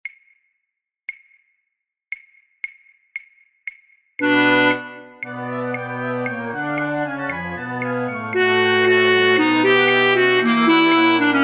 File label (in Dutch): fluitorkest, Altfluit 1B1;Altfluit; voor oefentracks; Matthaus Passion